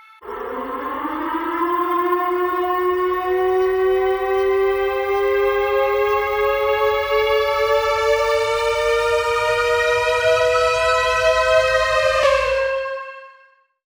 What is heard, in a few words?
siren riser